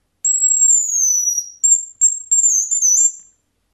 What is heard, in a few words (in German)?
Junger Hahn